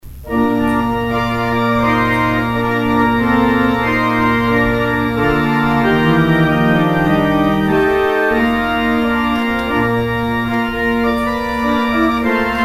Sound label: organ outro